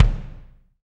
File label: BD, BD REAL